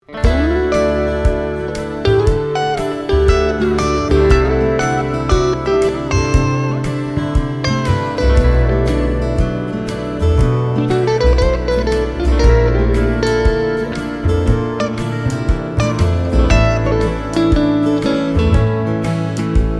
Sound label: Blues